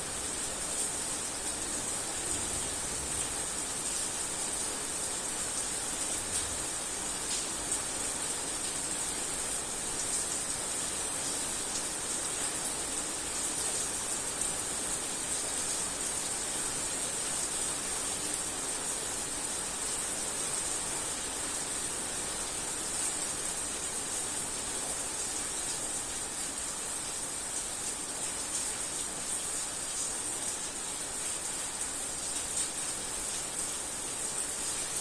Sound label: afternoon rain, Rotterdam Field Recordings